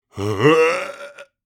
Elderly man nausea and gagging; vomit; gfxsounds

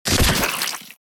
Cartoon splat noise large

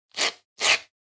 Shaving foam spraying

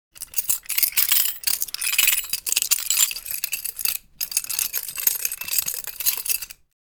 Prison door guard keys handling metal rattling

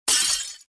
Small window breaking with debris

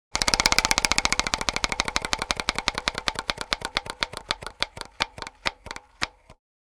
Casino game fortune wheel spin

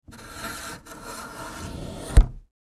Military radar antenna extending; military equipment sound effects

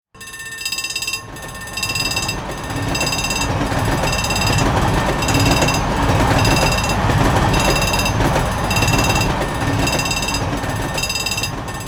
Train crossing bells approaching train; vehicle

